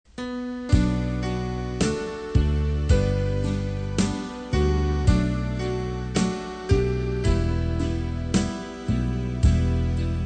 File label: BASS